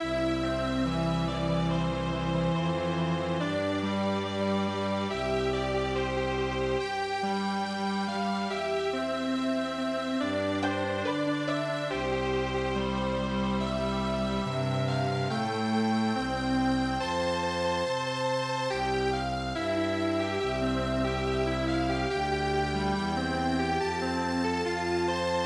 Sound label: strings; Musunde